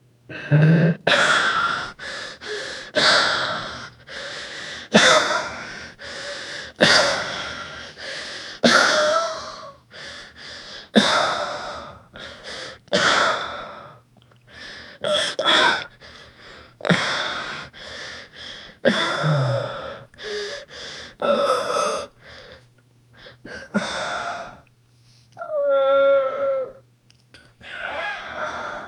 18 years old man holding; mouth closed

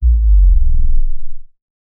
cinematic bass hit with r, synth

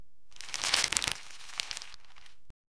crinkling of old paper as; advertisement

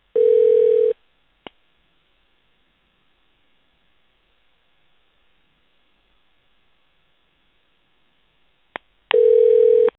phone call answered

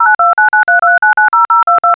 smart phone dial call sound

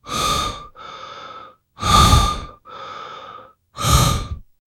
long heavy breathing

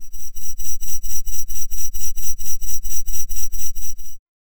futuristic build up sound; automated

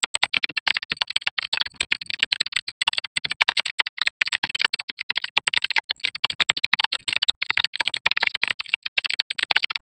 cyber mythical sounds

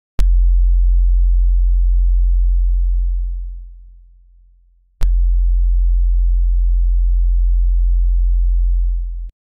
hook inmediato, musical

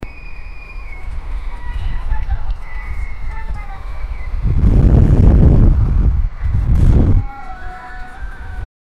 big city like new york, construction

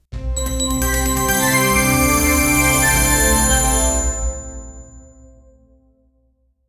podcast intro sound

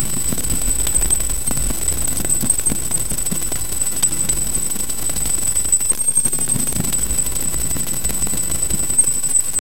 high pitched screeching, string

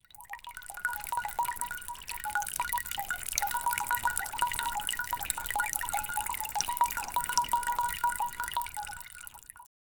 gentle bamboo water fount; meditative

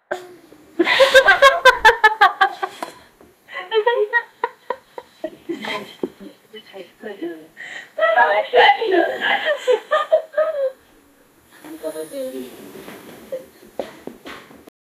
tv playing in the backgro, laughter

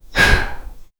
man inhaling and then aft, breathing

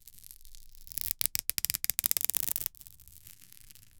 jute rope tension

